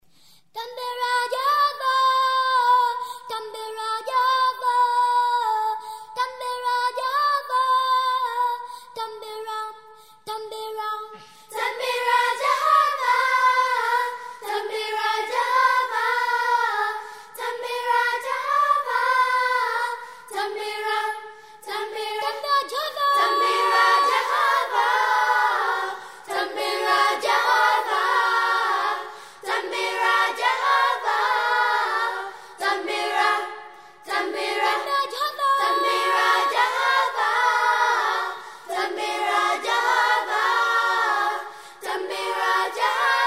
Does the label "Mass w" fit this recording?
Yes